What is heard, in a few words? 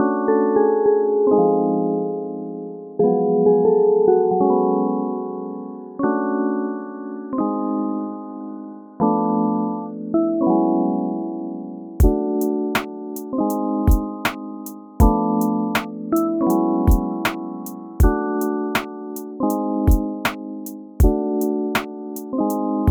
melodie drums